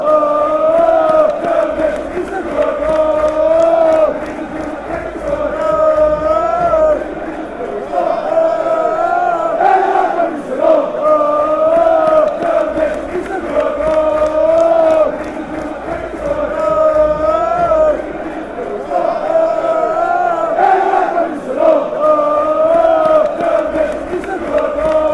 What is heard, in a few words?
beerschot chant